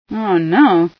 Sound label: startle, female